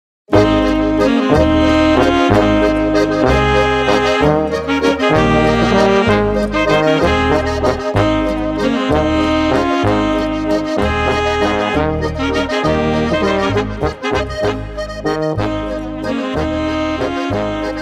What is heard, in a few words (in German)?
Almrauschwalzer